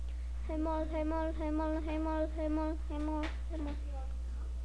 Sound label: hammer